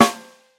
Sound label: hot acoustic snare A key, steel snare drum sound tuned to the A note